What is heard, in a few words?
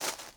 grass